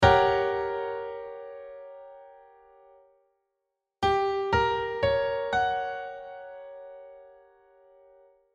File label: Gdim maj7